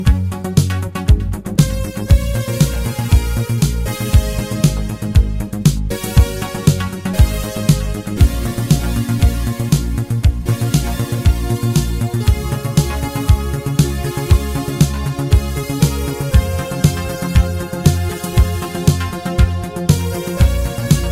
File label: bolero